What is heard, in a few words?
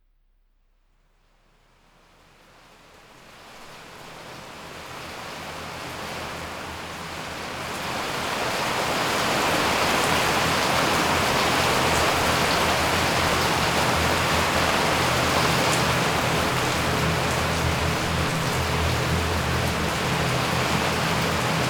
Nature Sounds, Rains Above